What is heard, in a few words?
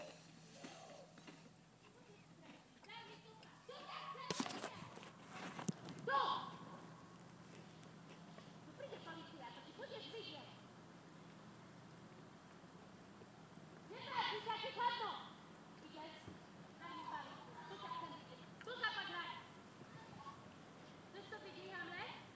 noisy neighbour boo